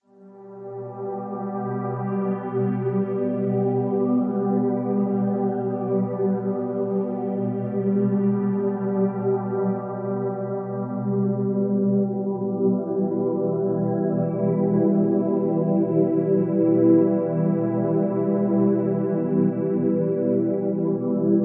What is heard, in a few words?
Dreamy synth, effects; Warm Ambient; Ambient SoundScapes